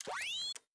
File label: aircraft ammunition shutter open